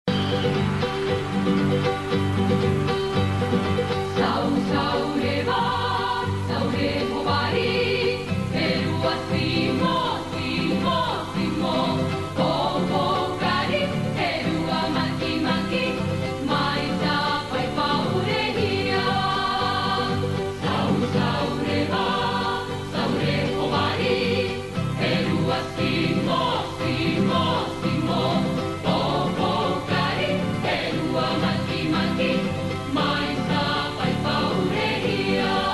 CHILE Traditional dance and music of Easter Island Rapa Nui